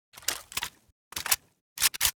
val reload; STALZONE

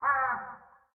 Small ambient crow; STALZONE

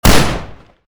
an94 fire; STALZONE

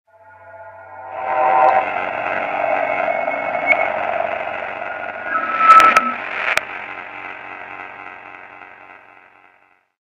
radar; STALZONE; minecraft

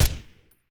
silencer; STALZONE

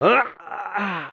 military death; STALZONE; customnpcs; stalker

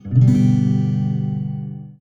G accord, guitar; STALZONE; customnpcs; stalker

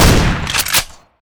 remi870 fire; STALZONE

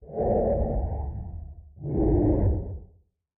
Small ambient fog; STALZONE

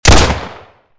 walter fire; STALZONE

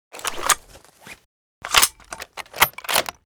sv98 reload; STALZONE

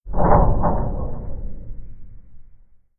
Small ambient metal noise; STALZONE

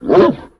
dog idle; STALZONE; customnpcs; stalker

